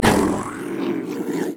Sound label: wolf angry; LoL; monsters